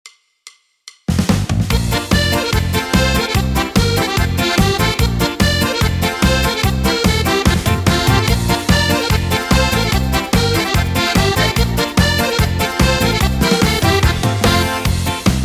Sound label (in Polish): Biesiada Mix Style Yamaha; psr sx900